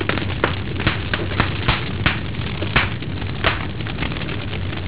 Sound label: crackling fireplace